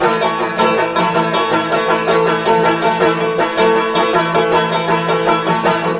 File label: banjo; music